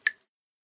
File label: Small ambient waterdrop; STALZONE